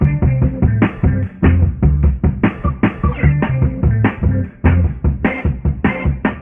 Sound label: subtle guitar and organ, Funky Drum loops